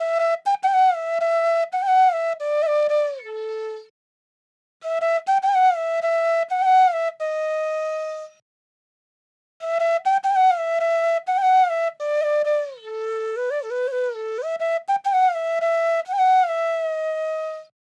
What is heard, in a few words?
90s Flute Loop 100 BPM D